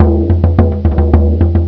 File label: bodh